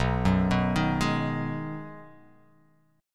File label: B 6th B down, chord